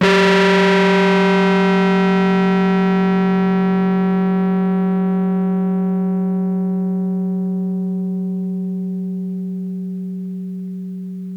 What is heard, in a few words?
REZ RHODES; PlatinumPhattCD2; E-MU Producer Series Vol. 8 – Platinum Phatt